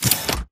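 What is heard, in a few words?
Stalker Movement Servo Head Retract; Titanfall 2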